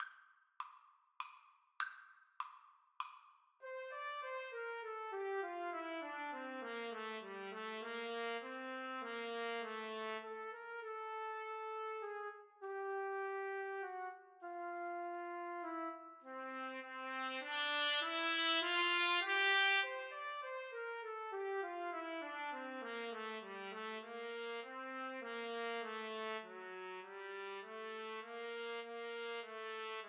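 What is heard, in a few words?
la virtuosa trumpet duet karaoke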